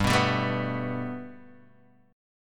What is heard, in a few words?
G Major Add 9th, Guitar, Open D